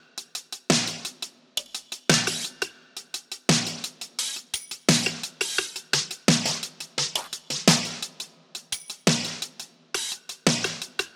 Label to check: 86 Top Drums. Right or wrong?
Right